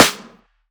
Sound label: bright steel snare drum g sharp key; sample; Duration 0:01; Samplerate 44100 Hz; Bitdepth 24